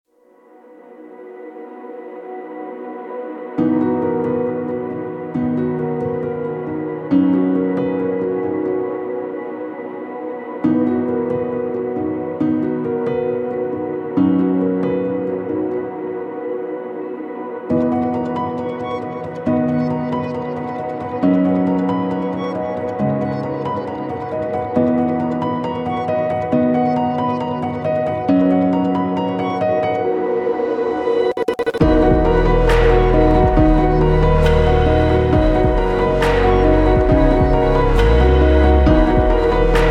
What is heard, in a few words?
Conce Pad, ambient pads; That Worship Sound®